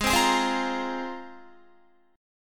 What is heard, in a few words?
Ab 6th Add 9th, Guitar; strummed